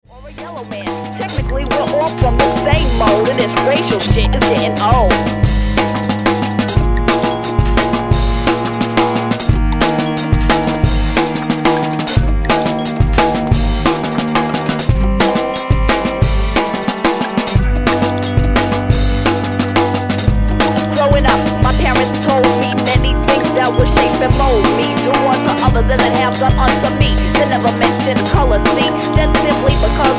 JUNGLE

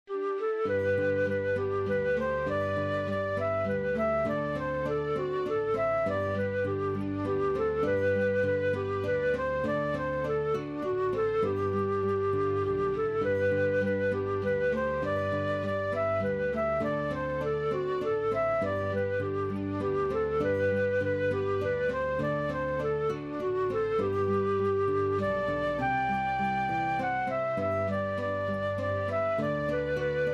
waltz

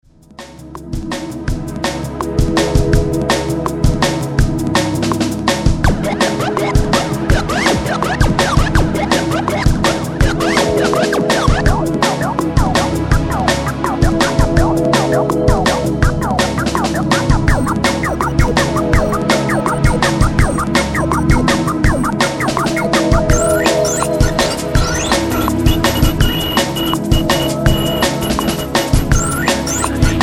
DRUM BASS

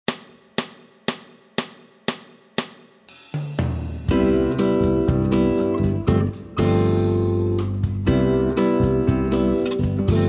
Latin Breeze

